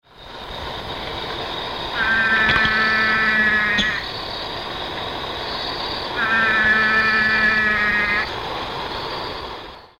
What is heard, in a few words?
Physalaemus olfersii; Juquitiba SP; A Silveira